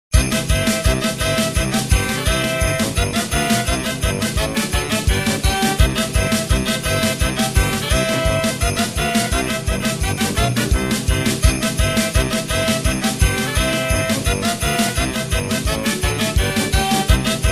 please no more jingle bells

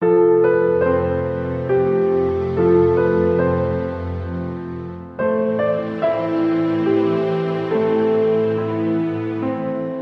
lullaby

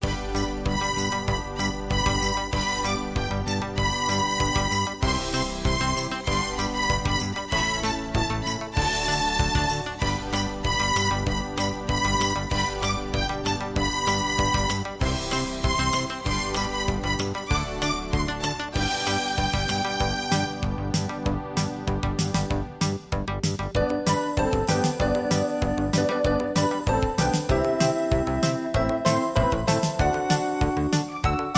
jingle bells

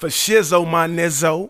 Rap Vox

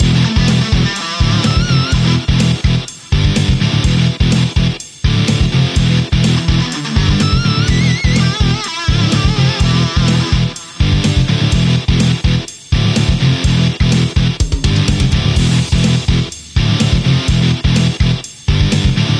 extreme rock guitar loop

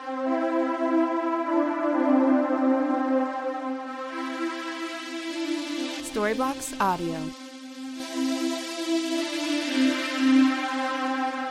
Ambient House Trance 125BPM A

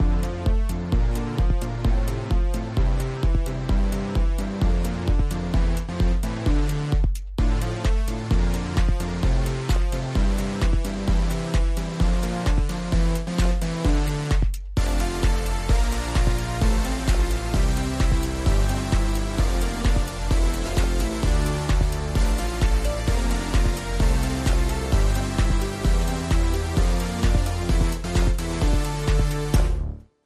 130bpm Alt Percussion

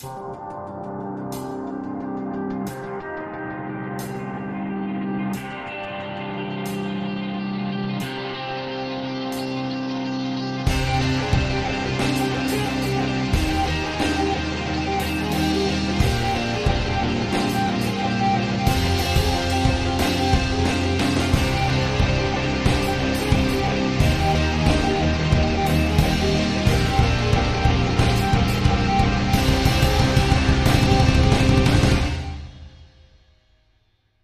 Alt Percussion

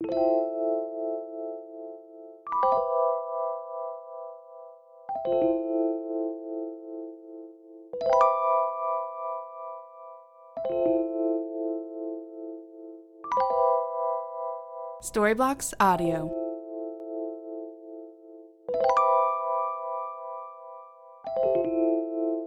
rnb 85 drums

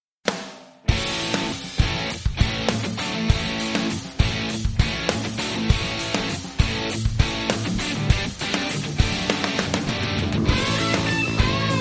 southern rock

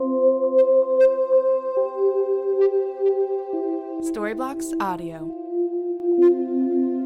Ambient House Melody 136BPM C

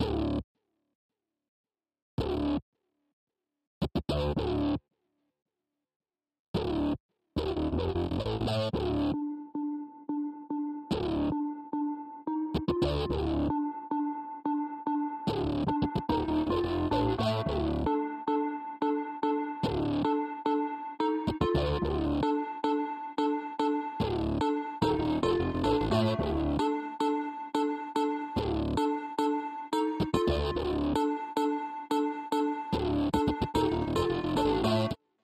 House Synth 110bpm

